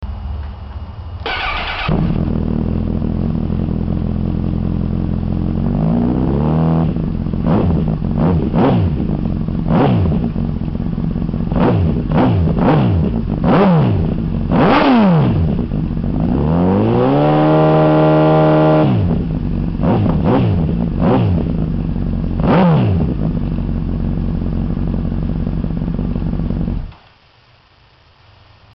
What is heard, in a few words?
CBR1000RR RS5 CarbonCone SlipOn, exhaust sound; honda; Yoshimura